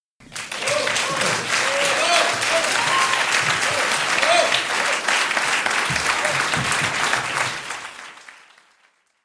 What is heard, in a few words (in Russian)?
rukopleskaniya so vskrikami